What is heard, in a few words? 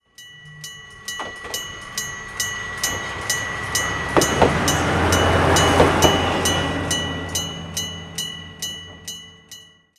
fumikiri